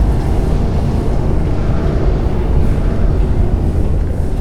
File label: cryo big creaky mach; Quake 4 Alpha